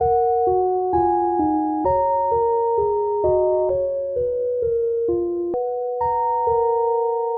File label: type keys; looperman